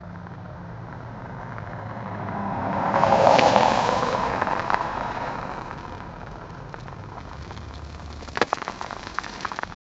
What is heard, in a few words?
old car drifting on grave; offroad